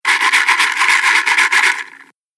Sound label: Shaking A Box Of Matches; Foley Sound Effect